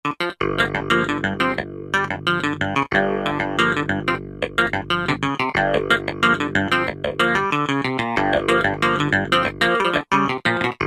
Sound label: Authentic Clav, Roland JD-990; Factory Patches